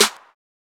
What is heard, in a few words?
Open Snares